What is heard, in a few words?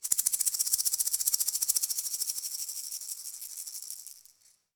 Maracas Fast Intro